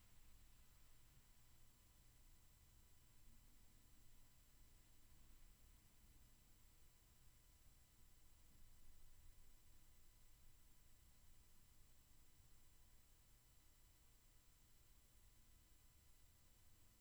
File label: roomtone